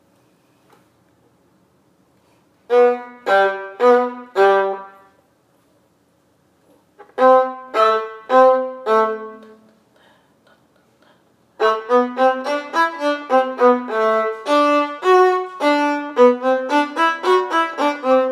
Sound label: Viola